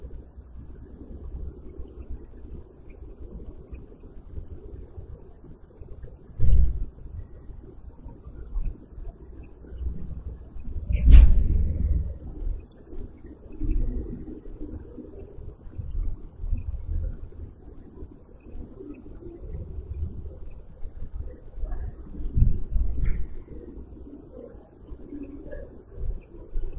Static

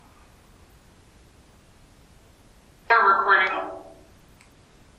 Canopy Room Phasma Box

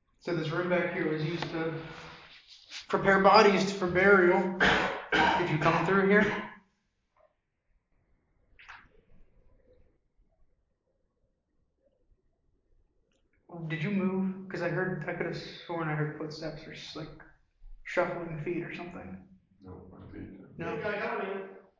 Morgue EVP; fairfield county infirmary return investigation

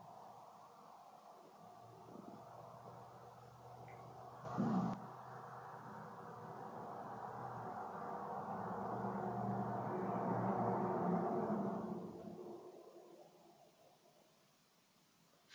3rd Floor Hallway EVP; fairfield county infirmary return investigation

